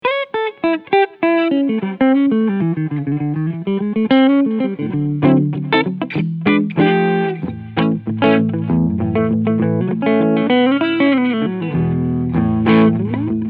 Hagstrom Super Swede Limited Cleansound Front Humbucker